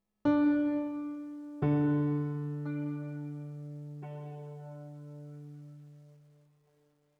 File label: One shot Piano